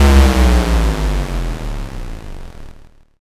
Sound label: explosion